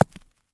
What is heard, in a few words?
Rock Dirt Hit; moul